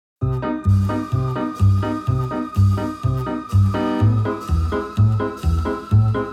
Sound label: Whale Falling music